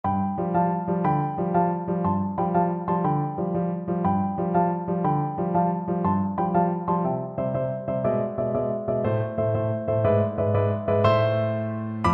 Oboe

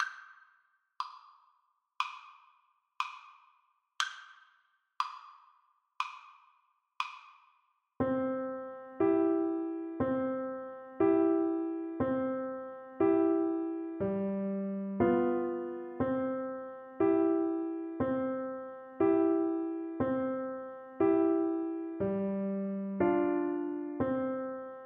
yankee doodle, Flute

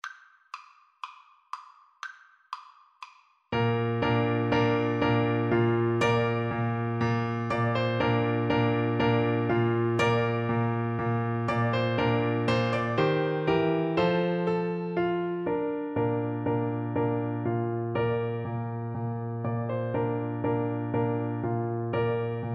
Soprano Saxophone

